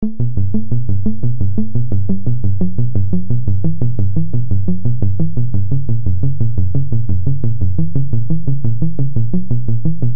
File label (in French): melancolie electro; version électronique